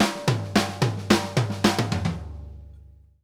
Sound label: SNARE L, drum fills; Sampleheads - New York City Drumworks VOL-1